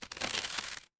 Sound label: paper fabric crumple